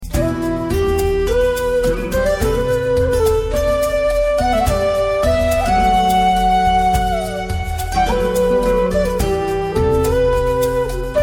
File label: Kit